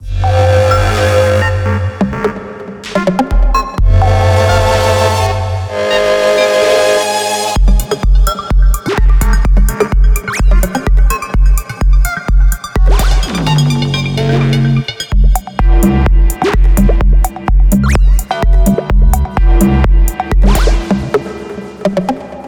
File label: Dreadbox EXPERIMENTAL; Zampler